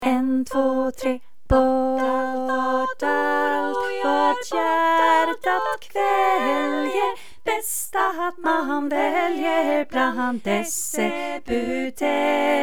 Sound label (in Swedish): Bort allt vad oro gör Alt; korsang; seniorkor